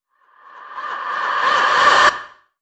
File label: Vocals Warning Reverse